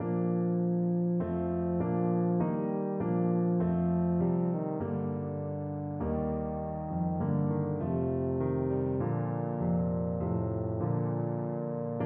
tuba

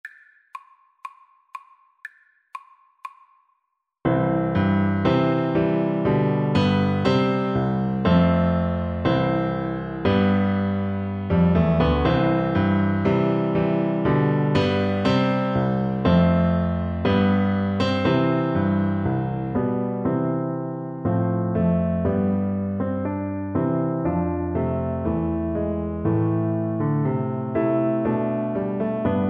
Bassoon

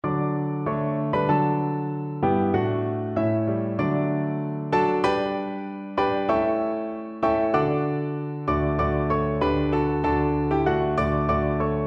joy to the world, piano duet

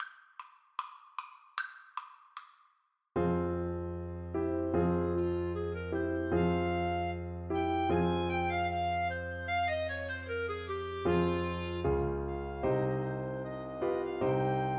Clarinet duet

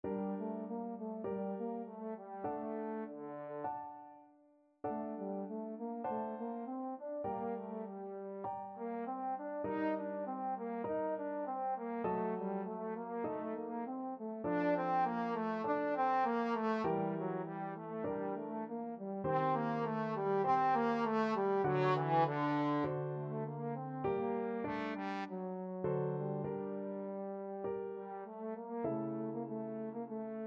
vivaldi concerto am 2nd rv356, trombone

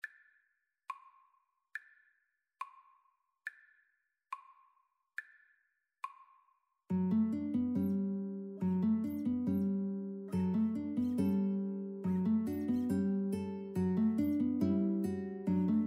Guitar Trio

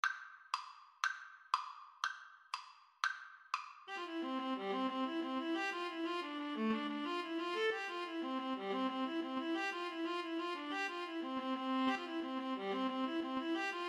irish jig medley viola duet

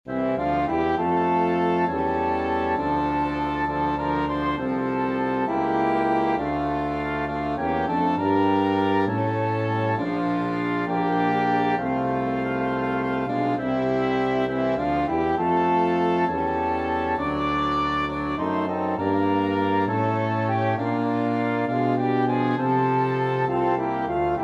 flexible mixed ensemble 4 players

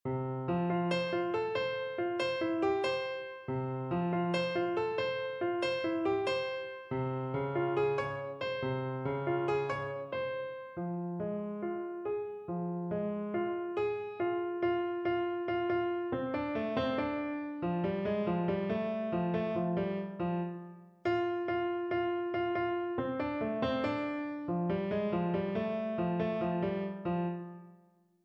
piano

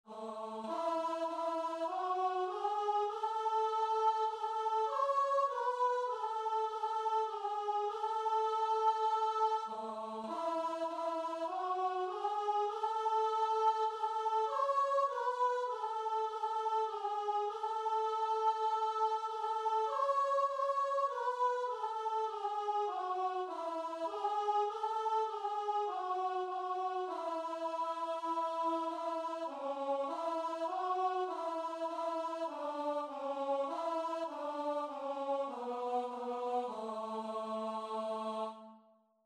all glory laud, guitar and vocal

